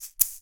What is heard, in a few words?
egg; 96khz 24b mono; Eggs; instrument de musique